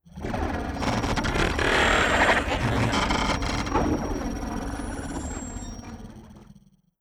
ai crisis room hits; Stellaris; synthetic dawn

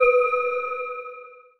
notification research; Stellaris

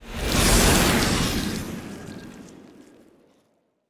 ant ship stinger move; biogenesis

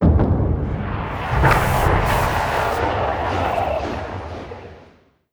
notification war; Stellaris

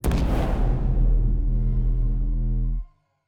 notification death; notifications; Stellaris; paragon

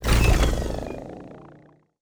gravity snare launch; Stellaris; grand archive; ships

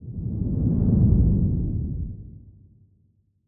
kaiju adult tail swish long low; biogenesis

